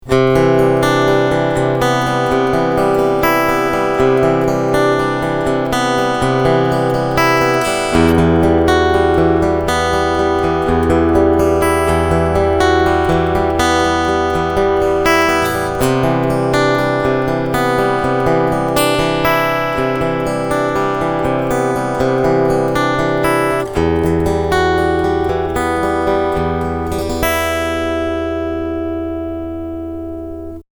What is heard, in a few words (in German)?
Picking Ohne CT